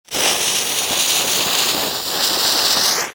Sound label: FUSE BURN